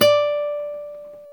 GTR Nylon, Nylon Martin

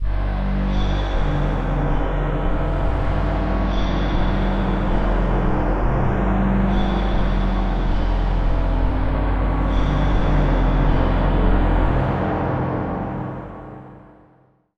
Pixie Drone, Musical FX; Zero-G Smoke

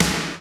SNR COMBO, snares; Roland - Rhythm Section; Snares 4; Sn Modules 4

